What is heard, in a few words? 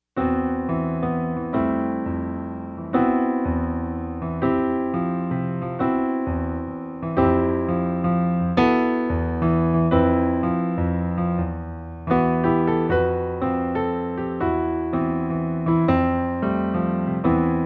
fragment in e minor